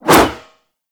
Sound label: Golden Band Staff Whoosh; Warframe; Monkey King